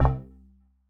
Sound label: Lock; android frameworks base